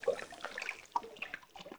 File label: Cavernous Water Lap Rock; Ambience; Dungeons; Under City